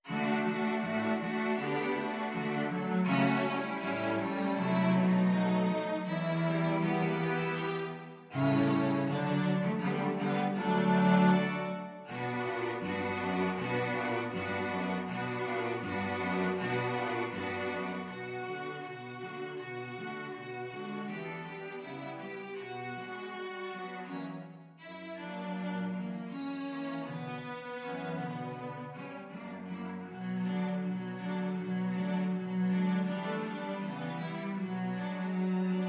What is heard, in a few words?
cello quartet